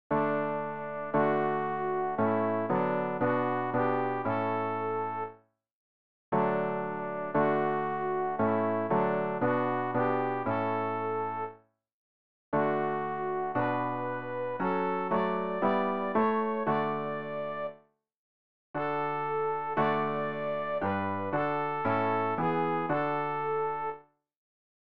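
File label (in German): sopran, Übehilfen; Ref. Gesangbuch; RG 102: Halleluja! Singt dem Herrn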